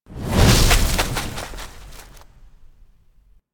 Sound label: Infested K Drive Boost Fast Stop, Vehicles